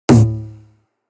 E-High Tom single

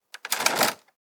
Hamper drag slide on ground; basket

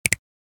Finger snap

